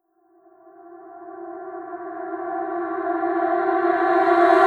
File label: reverse, vocal sample